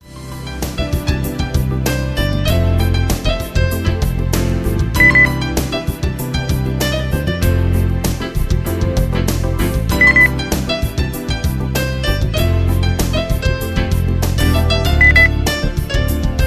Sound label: pop beep